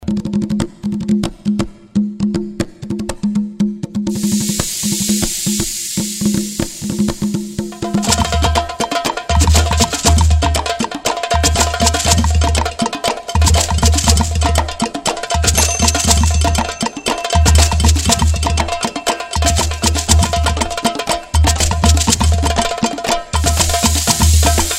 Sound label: african study